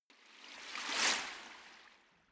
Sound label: whoosh bright